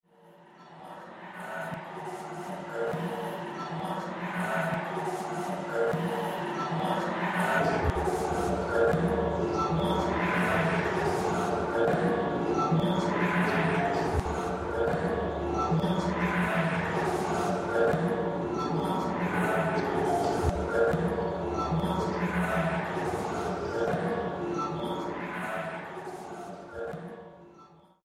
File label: Room Sound